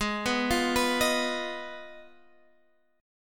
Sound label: G sharp Minor 6th G sharp down